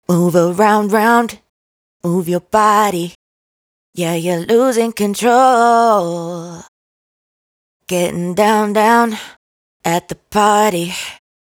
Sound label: hook 123 Dm, Dry Vocals; Raw Vocals; Losing Control; Slate Digital Omnivox Vocal Sample Pack